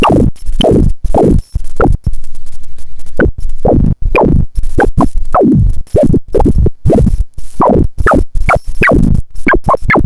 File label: piezo freq